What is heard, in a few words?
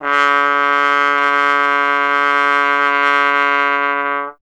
Trombone C#0; Miroslav Vitous; Solo Instruments